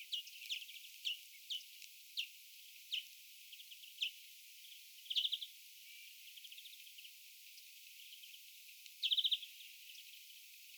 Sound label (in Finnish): viherpeippo